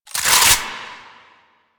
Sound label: AK47 Reload Chamber; Warframe; Tenno; Weapons